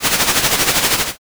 comm noise

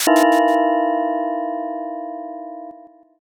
sting

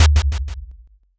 taiko

